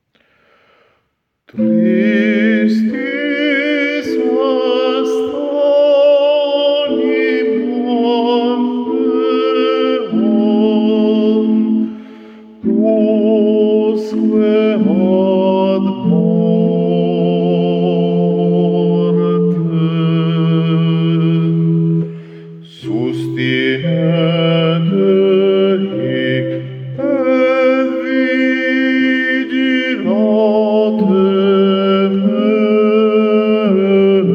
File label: Tristis est anima mea bassi e tenori